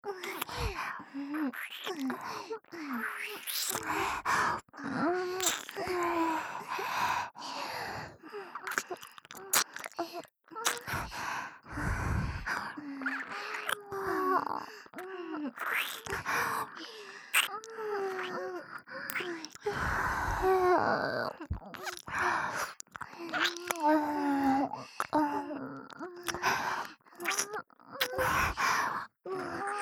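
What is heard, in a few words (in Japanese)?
作業喘ぎ＋片耳舐め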